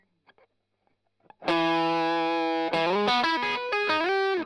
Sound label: Strum Line